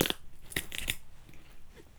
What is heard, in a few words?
Rip and Tear; Micro Pack; CaptSubtle